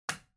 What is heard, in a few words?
Light Switch-Box-Rocker (Dry-Hard)